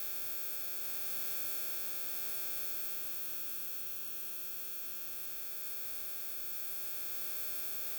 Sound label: Electromagnetic Speaker Loop Mono; Essentials Series NOX SOUND